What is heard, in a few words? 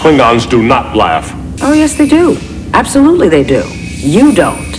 not laugh